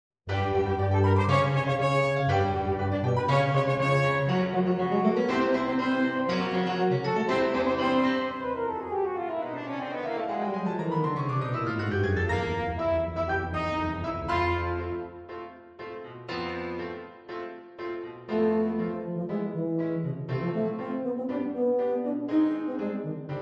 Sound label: five quirky miniatures march